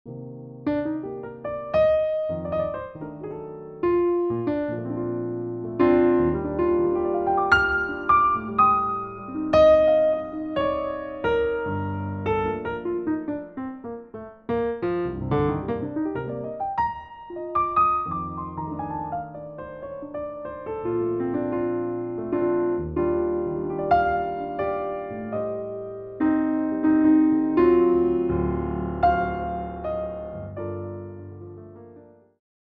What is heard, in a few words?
JAZZ